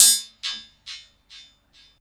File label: fx-percussion, Techno; Techno ID; single-drums